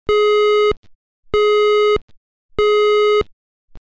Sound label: ALERT; Redback